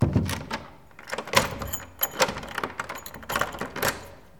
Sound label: Closing Old Door With Keys